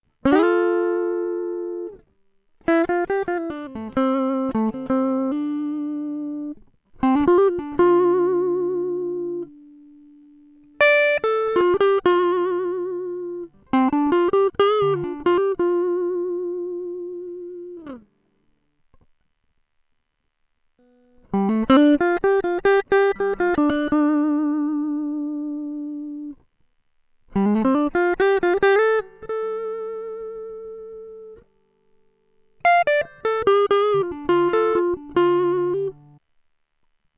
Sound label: solo